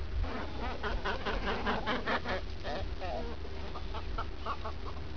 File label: Cormoran